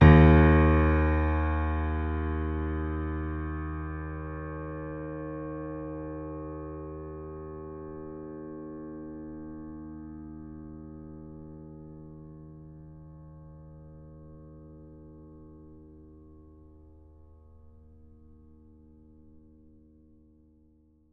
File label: D sharp 3